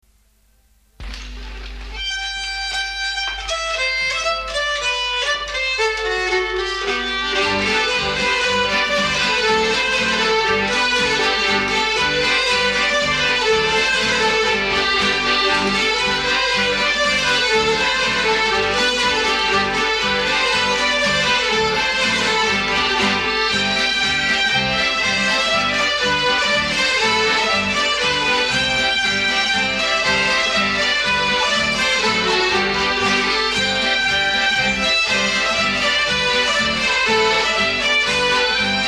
Polka G

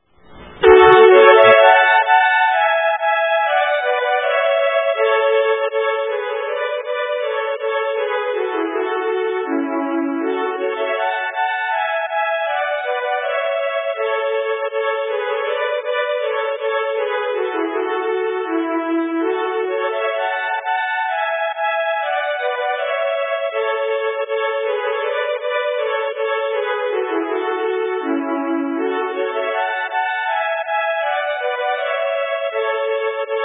polska; Matses Anders

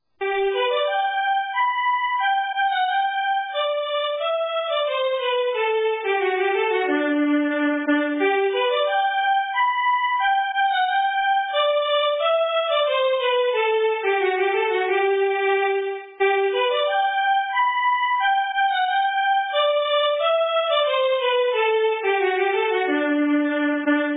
Polska

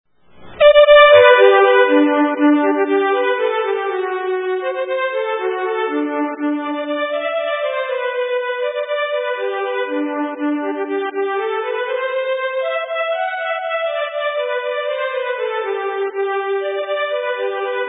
Polka; Laaghalte Rasmus

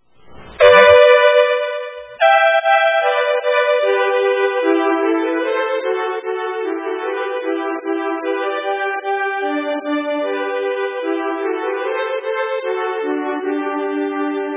Marsch

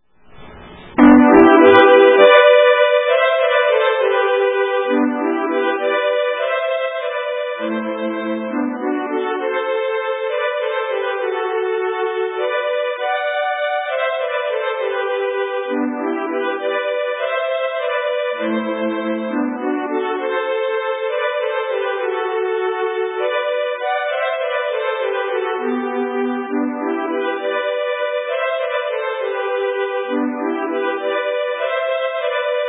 Vals; i Gammelgarden

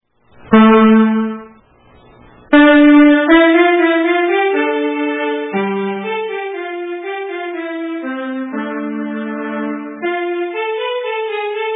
marschen

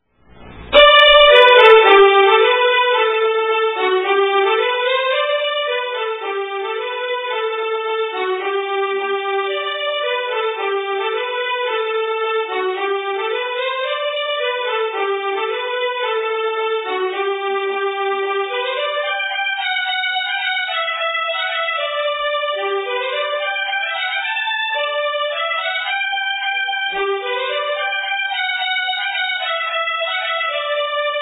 Polka

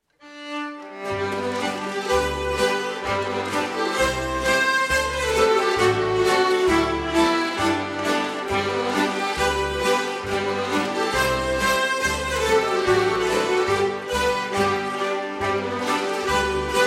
Schottis; f Hellevikstrand

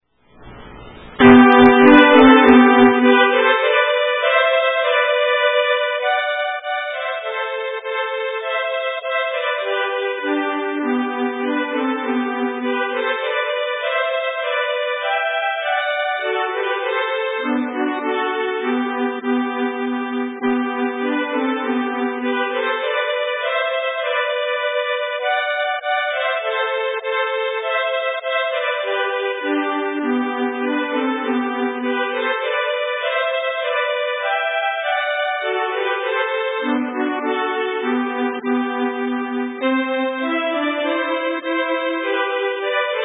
Schottis; Brogren

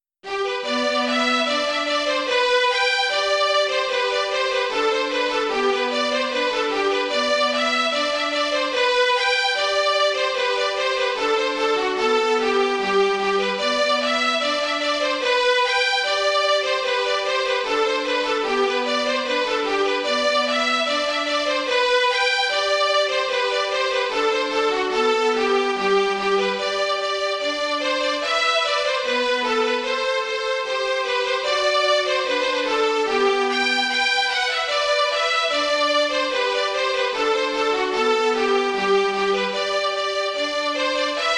Engelska

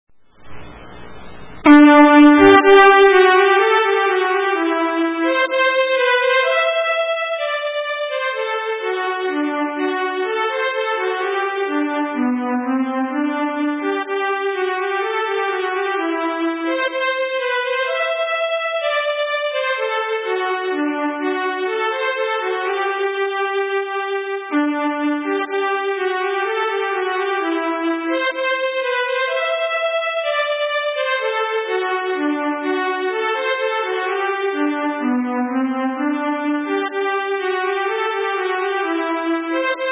polska

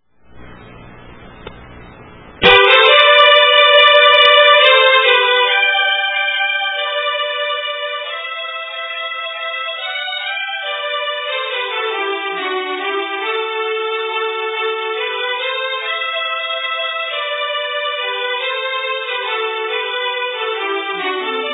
Vals

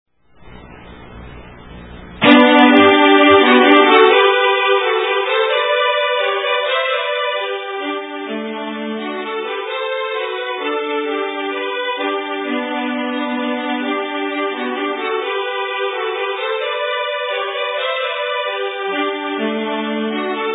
valsen; Bergavalsen

